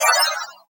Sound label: Pickup Scifi; SFX Kit